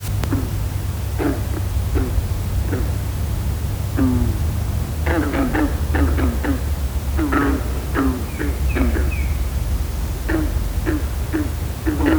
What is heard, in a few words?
lithobates clamitans; iNaturalist